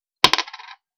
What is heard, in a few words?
coin drop